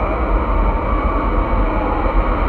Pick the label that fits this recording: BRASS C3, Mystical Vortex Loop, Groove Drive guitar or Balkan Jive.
Mystical Vortex Loop